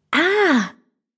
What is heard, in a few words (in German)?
Stimme "Ahh"